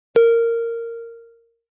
Dong; Dongs; multimedia

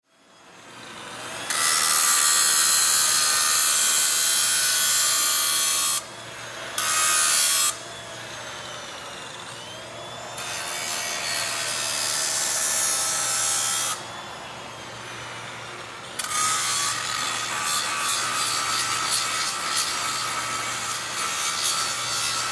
Table Grinder Run, Table Grinder Run Grind Stop; Grinders (Sound Effects:Machines); Blastwave

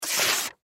Index Card Rip Cardboard Thin; Office; Materials; Blastwave

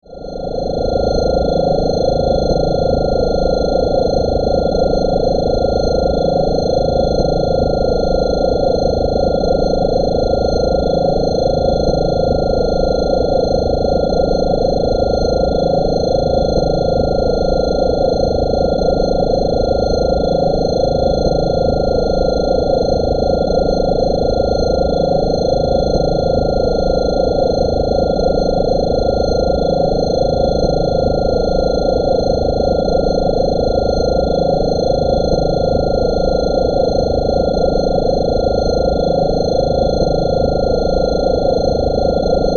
Science Fiction Alien Power Drone Modulation; Spacecrafts

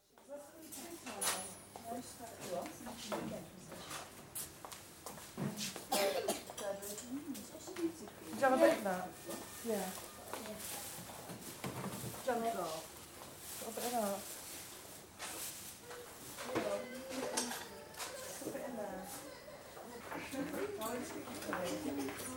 Childrens' ward atmosphere; Hospitals (Sound Effects:Backgrounds); BBC

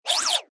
Cartoon Zap Up Down, Quick zip slide.; Comedy/Cartoon; Funny Sounds

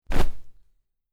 Cloth Character Movement Foley Whoosh

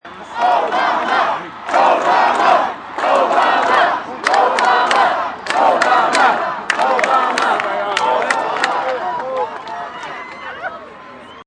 Crowd, rally crowd; Obama Rally Crowd; Political rally crowd sounds; Sound Effects:Backgrounds

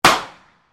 PISTOL 38 REVOLVER FIRING; Handguns, non military

